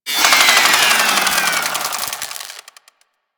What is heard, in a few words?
Spark Rifle Wind Down; Grineer